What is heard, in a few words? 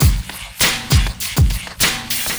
drloop, drumloops, Vinyl Attack; Masterbits